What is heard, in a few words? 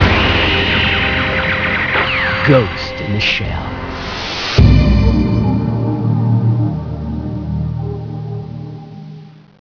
Intro long; Ghost in the Shell